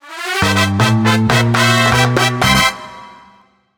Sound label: Course Clear; New Super Mario Bros. Wii Newer Summer Sun